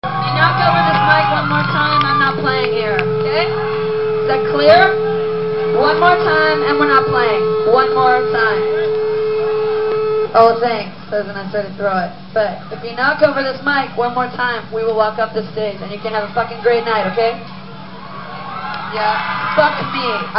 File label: Knock Over